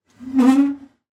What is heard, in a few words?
Creaks n Squeaks Drawer Squeak; soundbits